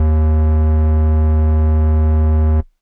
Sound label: Air Bass Kawaii Ds1; keys